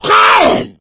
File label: hunter pounce; player; voice; attack; source engine; left 4 dead 2